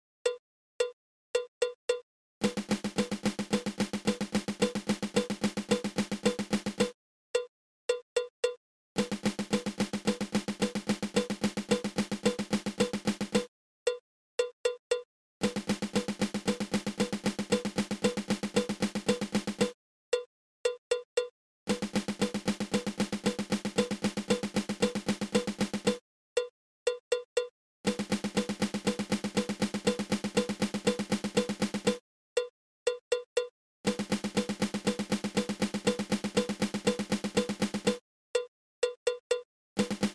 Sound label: Flam Tap